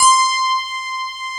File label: FM ELP C5 L, Keyboards Old School; USB Soundscan vol.09; AKAI; FM ELP 8